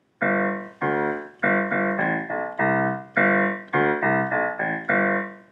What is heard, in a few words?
simple piano recordings